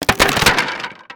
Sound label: crate